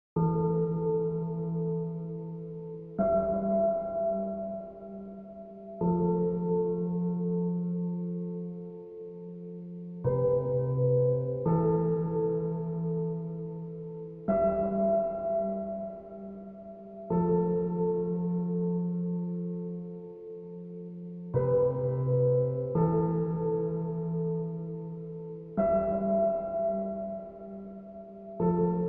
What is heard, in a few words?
piano